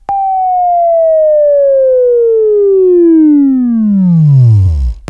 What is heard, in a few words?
WAV Left, Sweeping; Sweeping Training program